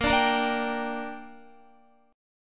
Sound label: A sharp 6th, Guitar; A#6; strummed